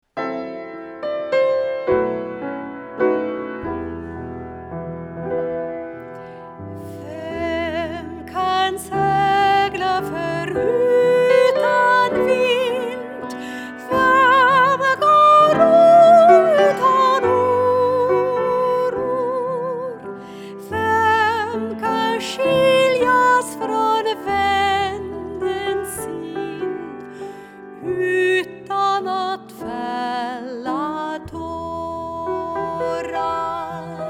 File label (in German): mit Gesang; Vem kan segla